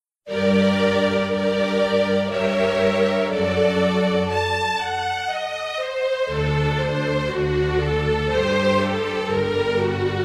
Instrumental; Pergolesi; Stabat Mater

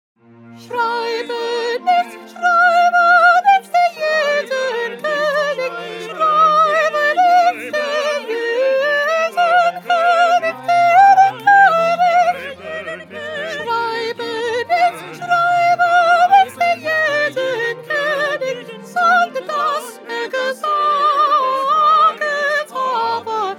Soprano; Bach; St John Passion

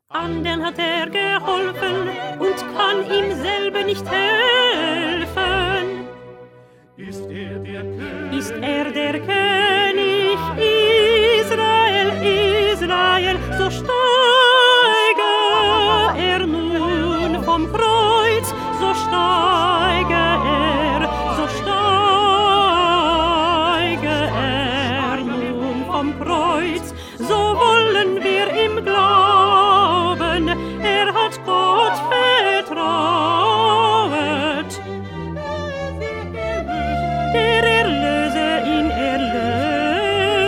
2nd Alto; Bach-St-Matthew-Passion-67b

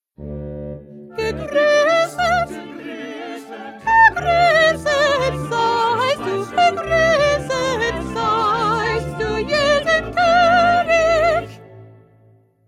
1st Soprano

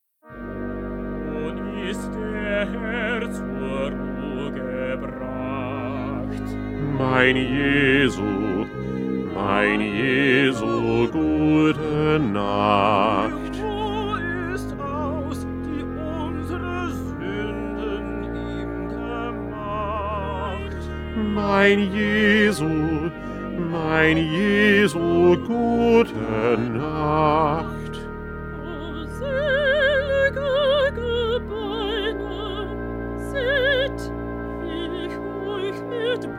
Bass; Bach; St Matthew Passion

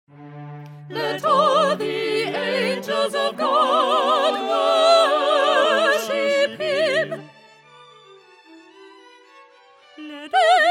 Soprano; Handel; Messiah

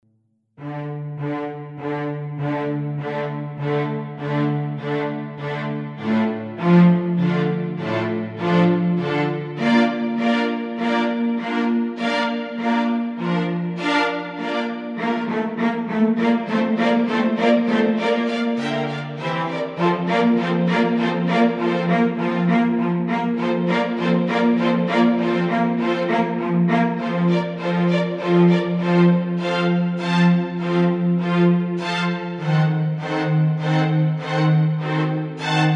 Instrumental; Handel; Dixit Dominus

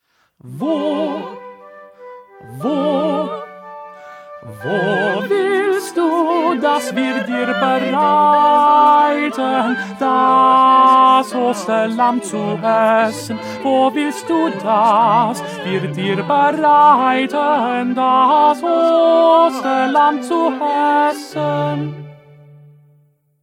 Tenor; Bach; St Matthew Passion

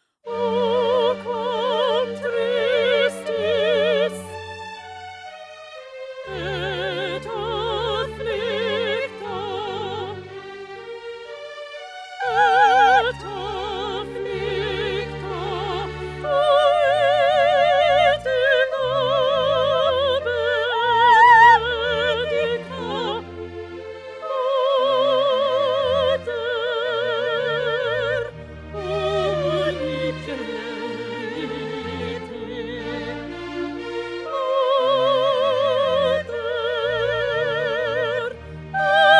Stabat Mater Concert Version; Pergolesi

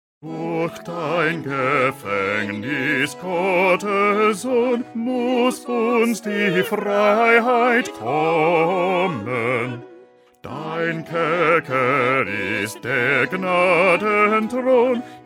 Bass

